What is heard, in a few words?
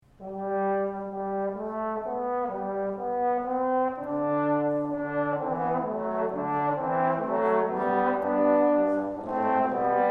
Trombone Quartet; Canzonet; The Johnson State College Concert Band